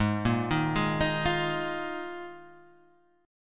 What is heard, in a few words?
Eb Suspended 2nd Suspended 4th; Ebsus2sus4/Ab arpeggiated